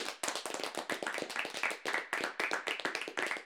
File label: Clapping, Six Persons In Room